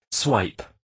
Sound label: swipe